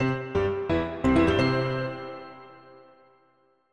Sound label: Solve Fanfare